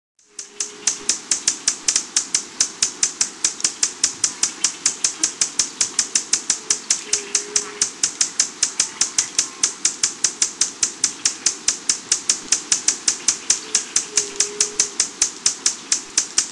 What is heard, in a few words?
buff bellied hummingbird